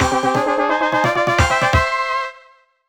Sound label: brass fanfare; batbarian testament of the primordials